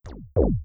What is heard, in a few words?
warp in; spacetac